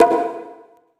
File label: Big Conga